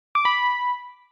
Message Ringtone

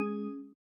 huawei tone

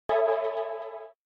Capella Motorola Notification Ringtone

